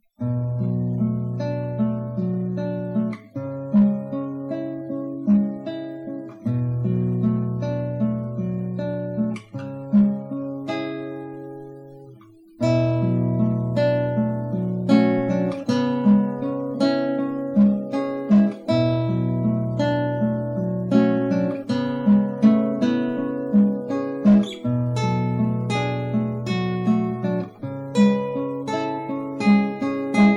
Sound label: ambient chillout two guitars